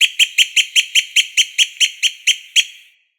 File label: Bird Alerted Blackbird Call; Midrath Island; Ambience